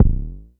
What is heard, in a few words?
Tech bd, Jomox Airbase99